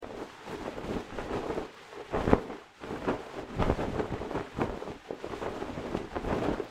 Flapping Tarp; A Difficult Game About Climbing

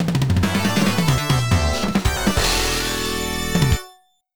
Stage Complete; Earthion

